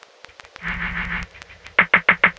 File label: drloop, drumloops, Vinyl Attack; Masterbits